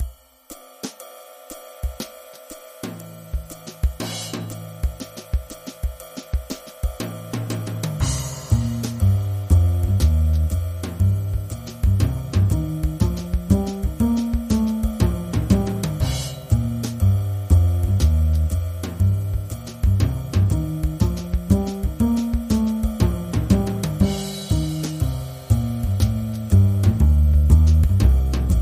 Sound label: Walking Bass Lounge